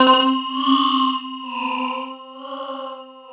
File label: SYN, 130 BPM; Ueberschall; Drum & Bass Flash Trackz